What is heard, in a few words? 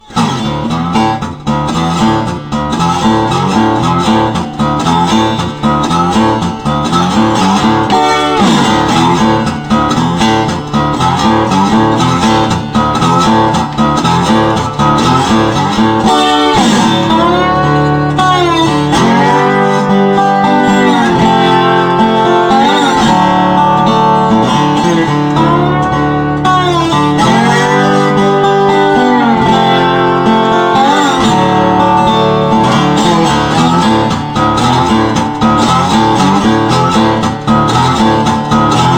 Solo Guitar; Rage; Westar